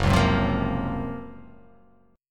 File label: F sharp Major Sharp 11th F sharp; strummed; JGuitar